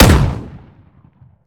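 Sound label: romeo870 fire player, weapons; gmod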